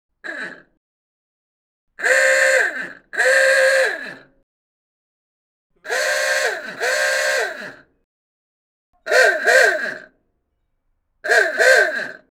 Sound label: Klaxon ooogah